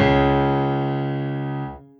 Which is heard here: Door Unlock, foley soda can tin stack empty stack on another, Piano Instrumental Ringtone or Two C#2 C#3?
Two C#2 C#3